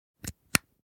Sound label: Camera connecting to charger usb cable